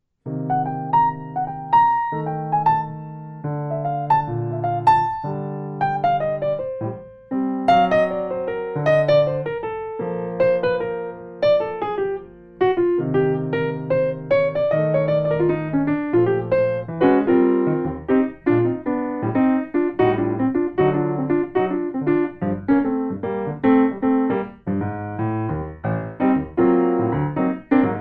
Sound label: Jazz, Jazz Piano; jazz piano teacher jazz performer broomfield